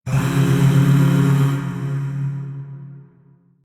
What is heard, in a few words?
Void Shawzin Phrygian Chord; Warframe; Zariman; Chords